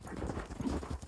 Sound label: horseman walk; propack